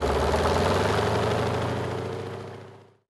engines; World of Tanks

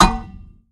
tank hit small interior

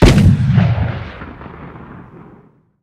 art cannon shot far

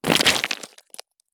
stop gravel; Escape from Tarkov

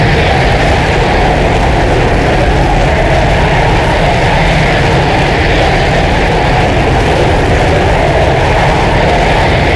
Aircrafts L-39 AI25 Back; DCS

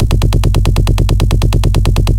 loop oerlikon kda 35mm bass; guns; tanks; War Thunder

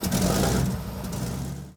start engine, engines; World of Tanks

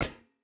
footstep rubber boots metal run; Rust

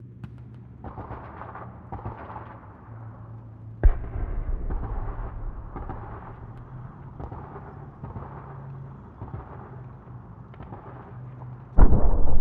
warfare amb tanks med

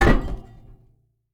oil refinery deploy; Rust

